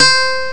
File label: gui stop; ST-XX Amiga Soundtracker Sample Packs